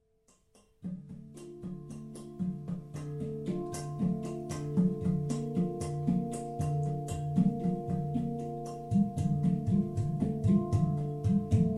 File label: handpan udu